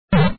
Jump sound; Wario Gets Straight

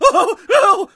Scared Male; Friday the 13th: Killer Puzzle

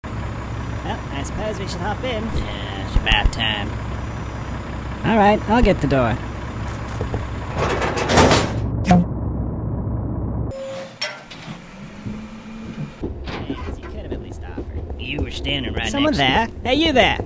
Magic Hat

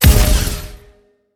Bomb Explosion

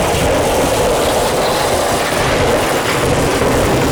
Scrape Loop; Nicktoons Nitro; arcade

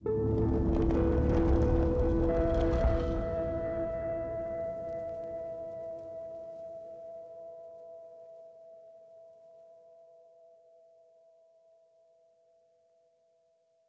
Jingles

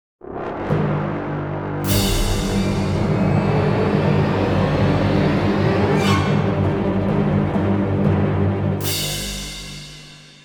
Boss Fight Start; children of morta; Temple Music

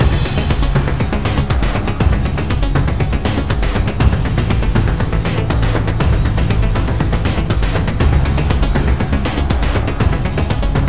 BGM Kowloon Park Boss

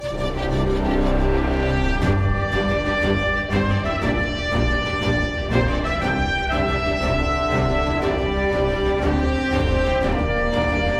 Victory Menu Screen

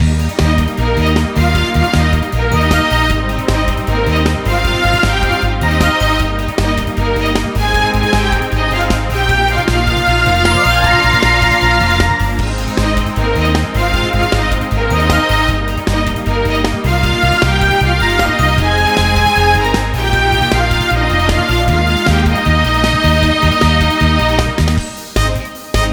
anime loop; Girl and Goblin

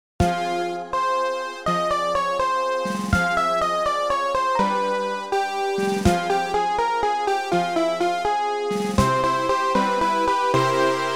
Sorrow Retro

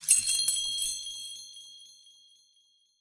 Pendant; Yu-Gi-Oh! Master Duel

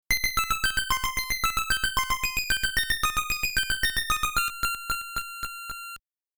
Level Up BGM; Esper Dream 1 2 Sound Memorial; Hello, Fairy!

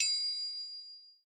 Correct; Idle Wizard

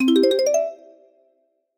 Collect Note; Banjo-Kazooie

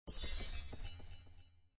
Pasta Strained; papas pastaria to go; android

